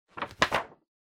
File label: Calendar Flip